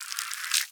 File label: Egg Shake Louder; The Inn